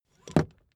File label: car seatbelt buckle hit